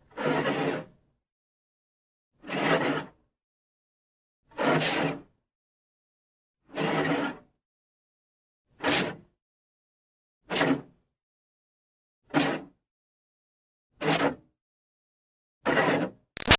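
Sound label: Water Spray Interior Car By Hood Fast; Car Wash; Spraying; Big Room Sound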